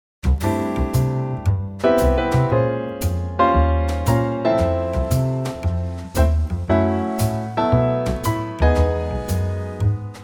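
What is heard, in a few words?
Trio C, Backing Tracks for Male Vocals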